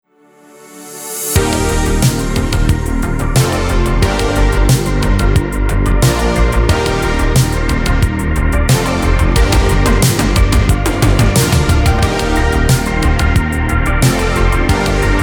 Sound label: synthwave intro